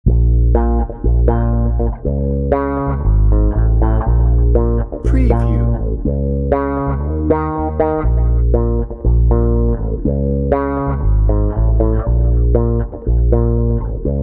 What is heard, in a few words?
bouncy bones no drums